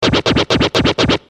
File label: Scratch